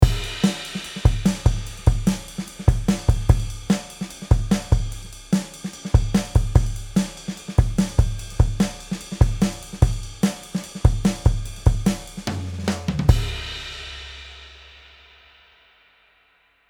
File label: Drumgroup 2db Comp; Heritage Audio HA-609A